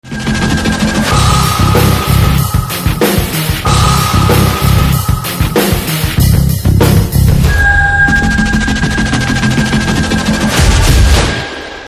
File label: Theme Telugu Ringtone; Guna 369